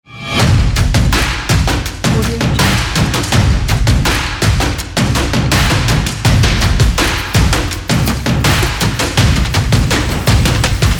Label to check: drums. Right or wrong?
Right